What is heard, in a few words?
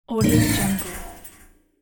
tink bass hit